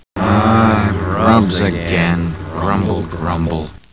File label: grumble